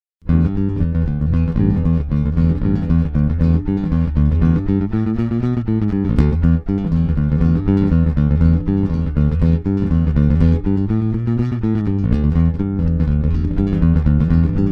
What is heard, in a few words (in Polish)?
Budgie kostka, humbucker